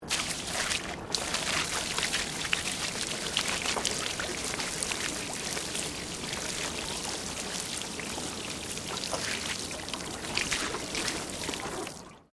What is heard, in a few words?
Water Pour Concrete Small